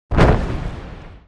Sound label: Cannon Attack; Knight Online